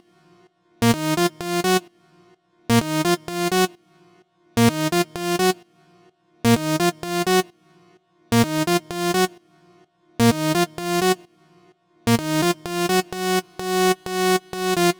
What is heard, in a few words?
synth melody 2, Key D#, Bpm 128, Big Room House; Loop Nerds; Big Room House Allstarz